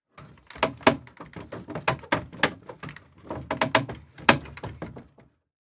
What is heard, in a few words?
Gate Wooden Gate Rattle; Foley